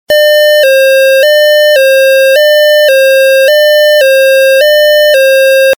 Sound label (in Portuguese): buzina de ambulancia 8 bits